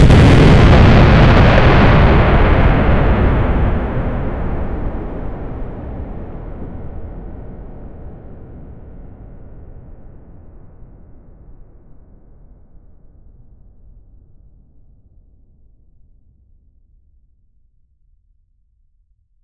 huge explosion, booming

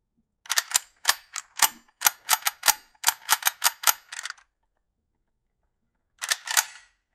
revolver reload

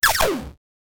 weapon shoot 8 bit, arcade

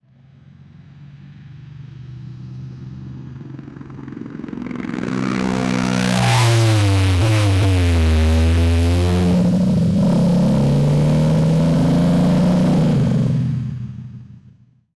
motorcycle rocket boost; acceleration

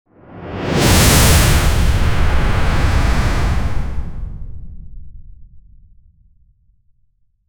the puff sound that usual, magician

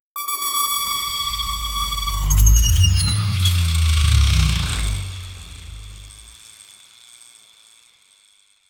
fast futuristic sci fi, cinematic impact

